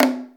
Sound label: GRUGRU, ETHNIC PER; AKAI S-Series CD-ROM Sound Library VOL-5